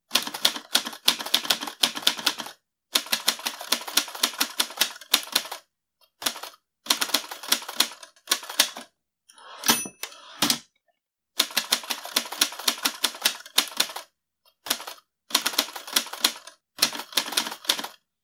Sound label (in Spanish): Máquina de escribir, Efecto de sonido